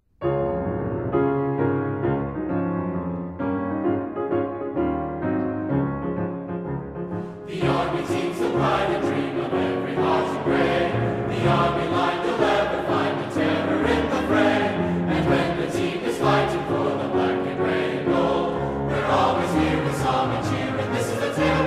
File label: Accomp; Cadet Version